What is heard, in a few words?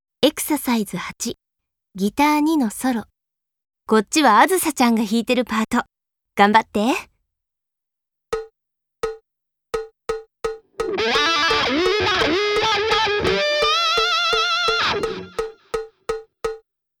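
Guitar II Solo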